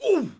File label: Knight Hit Vox; Civ4 warlords